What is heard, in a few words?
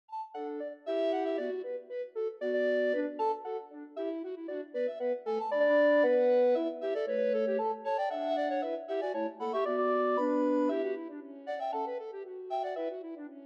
Bamboo rigadoon